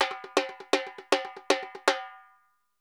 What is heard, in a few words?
Repique Poly 120, Drums & Percussion; Best Service; Festa Latina VOL-1